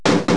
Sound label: hammer; CD-ROM Magazin 1996 September